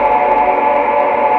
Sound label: vox synth low; Vocal